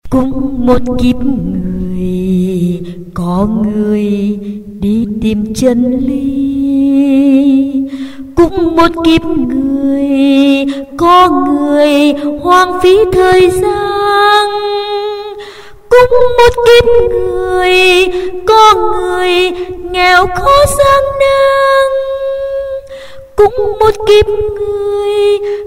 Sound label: tenor basso